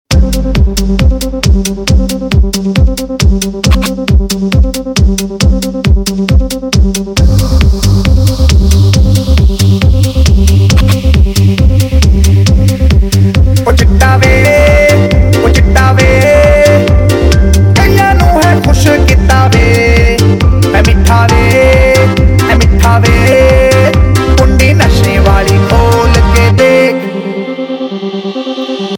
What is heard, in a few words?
Kundi Nashe Wali BG; Udta Punjab